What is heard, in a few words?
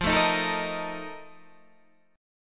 F 11th, Guitar, DADGAD